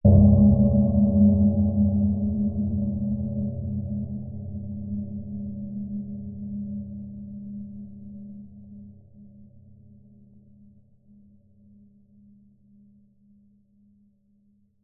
hit impact dark deep metallic atmospheric low pitched; sound design